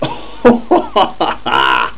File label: HAHAHA; Games.Carmageddon